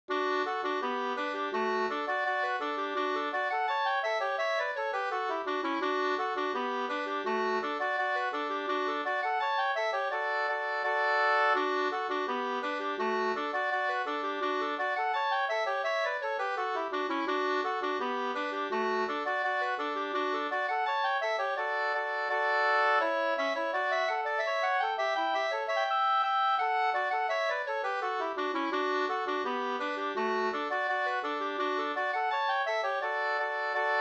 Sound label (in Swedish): engelska